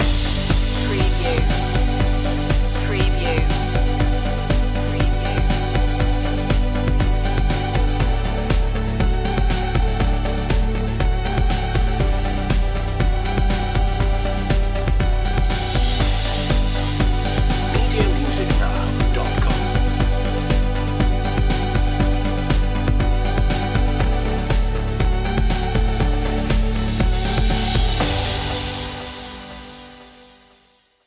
uplifting light

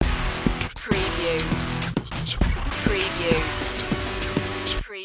beat boy stinger

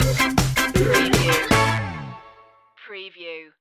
happy ska town stinger

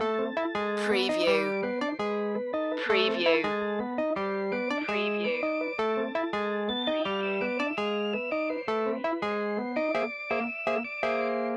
spanish tango music loop

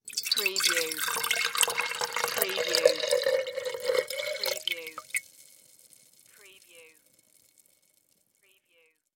Pitcher Pour Glass

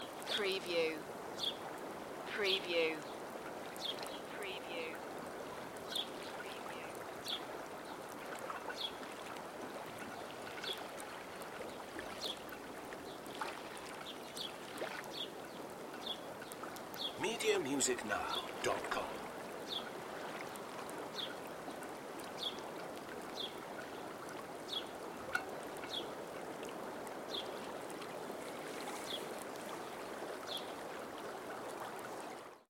Borth Estuary boat masts sea and birds; beanstalk audio

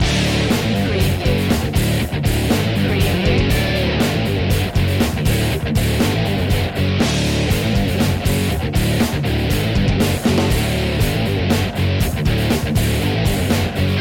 metal loop

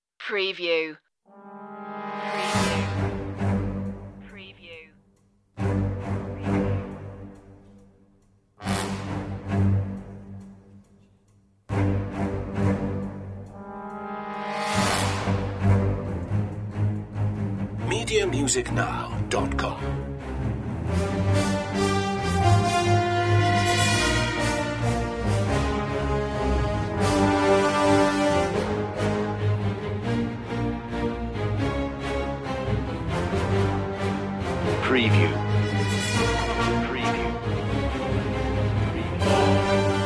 strings brass choir

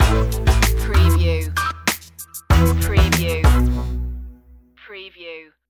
beats stinger, chill

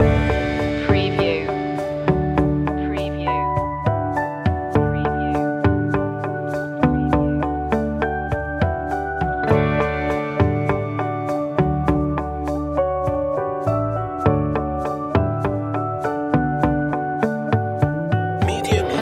will it rain loop

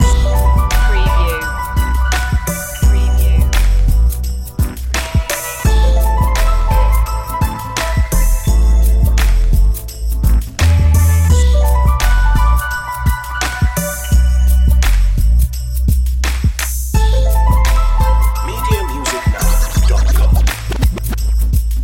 urban streets loop

